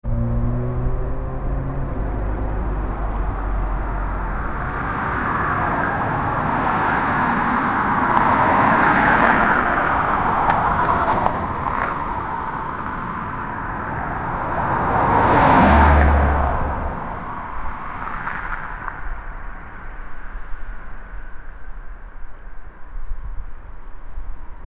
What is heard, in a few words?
car driving off, acceleration